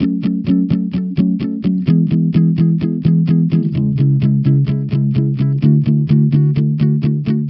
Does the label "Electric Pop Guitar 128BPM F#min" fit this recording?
Yes